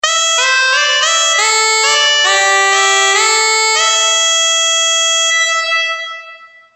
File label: cutaway